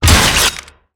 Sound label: Bone Scorpion hit; Karutan